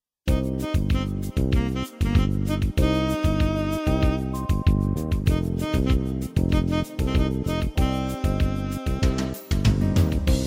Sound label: Fast Bossa